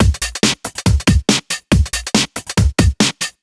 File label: Break Beats, 140 BPM